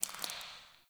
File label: Derelict Interior Water Drip; Ambience; Warframe; Orokin Derelict